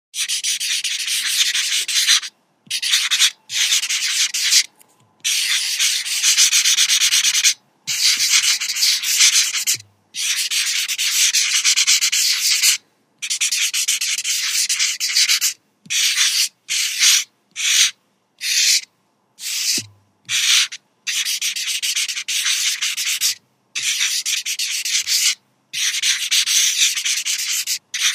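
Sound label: writing with a marker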